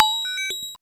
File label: MOOG HAT; AMG - Vince Clarke Lucky Bastard